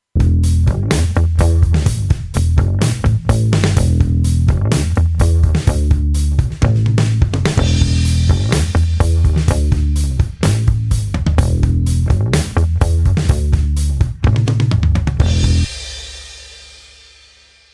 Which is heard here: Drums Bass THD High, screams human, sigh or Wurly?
Drums Bass THD High